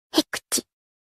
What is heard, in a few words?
cute little ram sneezes; rezero ramu